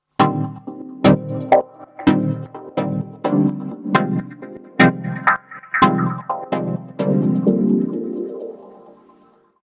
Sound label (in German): Pur Mit Side Chain